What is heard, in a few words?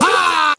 ha, Voice ooh ahh